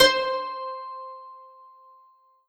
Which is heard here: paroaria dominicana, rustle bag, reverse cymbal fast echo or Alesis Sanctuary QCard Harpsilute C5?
Alesis Sanctuary QCard Harpsilute C5